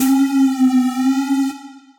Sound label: Sad Triangle; Effects; Ableton; Skitter and Step